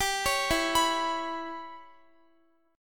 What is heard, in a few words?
E Minor 6th E, Guitar; Em6; arpeggiated; x,x,17,18,16,18